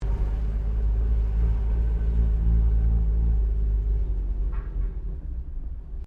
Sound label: CAR HOLDEN HD PREMIER INTERIOR REVERSING, Classic Australian cars; Holden Hd Premier 1965; Vehicles